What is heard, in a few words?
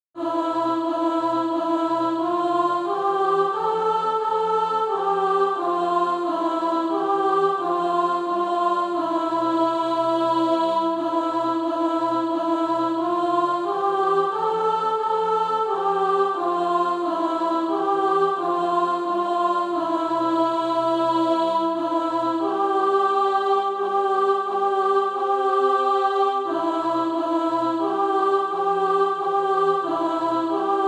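alto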